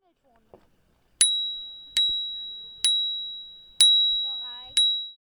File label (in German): fahradglocke